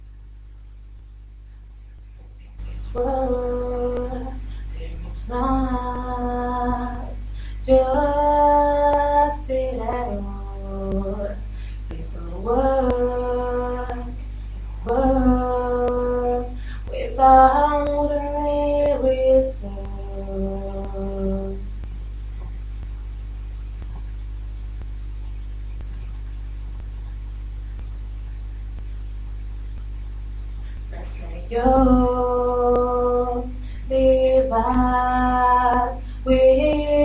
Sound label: Rainy Tuesday Voice